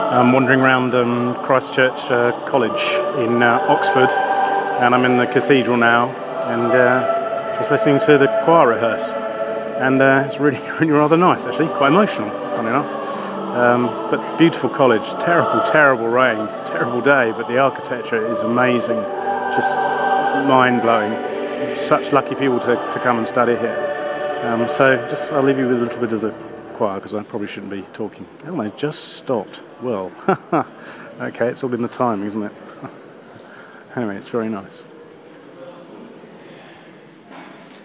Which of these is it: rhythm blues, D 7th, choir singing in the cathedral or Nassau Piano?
choir singing in the cathedral